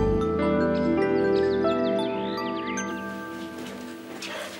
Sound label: Smooth Transition, Music